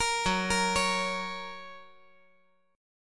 F sharp Major

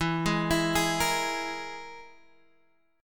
E Major Flat 5th E, Guitar, Drop D; chord shape

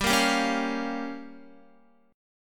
G Minor 7th Flat 5th, Guitar; strummed

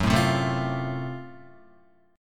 Gb Minor Major 7th Flat 5th, Guitar; strummed; 4F,4A,5C,5G,5C,6E; 1,0,0,2,0,1

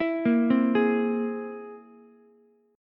C Augmented 7th, Ukulele; arpeggiated; JGuitar

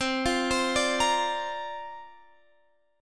C 9th Suspended 4th down Guitar Standard; C9sus4 arpeggiated; JGuitar

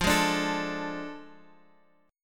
A Major 7th Suspended 4th Sharp 5th

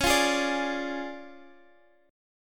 D Minor Major 7th Flat 5th, C sharp

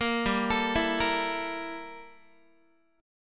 G Minor 6th Add 9th, Guitar; arpeggiated; 4A,4E,5A,5D,5G#,6C#; Gm6add9; 13,15,12,14,14,x

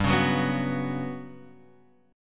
G Major 7th Flat 5th

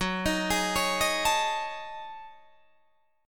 Gb Minor 6th Add 9th Gb down Guitar DADGAD

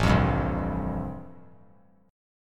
A-Minor 7th Sharp 5th, chord; 9,12,10,10; Am7#5 strummed; JGuitar

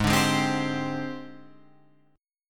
Ab Major 7th Flat 5th Ab, down, Guitar, Drop D

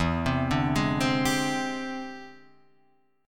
E 7th Sharp 9th Sharp 5th, chord

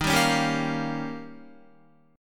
D sharp 9th, Guitar; D#9; strummed

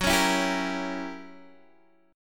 F sharp 7th Flat 9th F sharp down Guitar Open D

